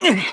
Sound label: damage; MonsterHunter; Fight